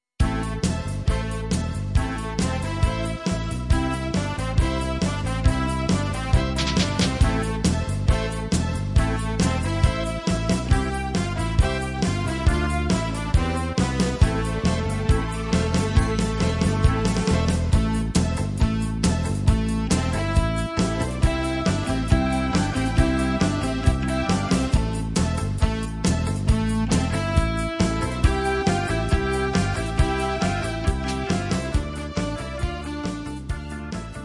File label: instrumental